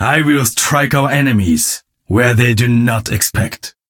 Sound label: battlecry; genji